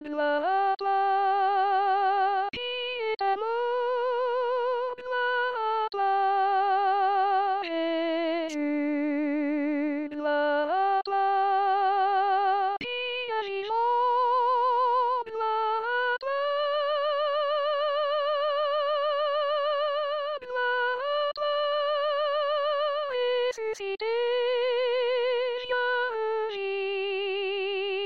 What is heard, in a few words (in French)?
soprano